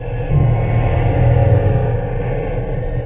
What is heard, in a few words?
manhole loop; hl2